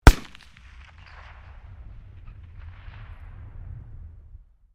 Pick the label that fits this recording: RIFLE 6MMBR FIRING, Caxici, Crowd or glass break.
RIFLE 6MMBR FIRING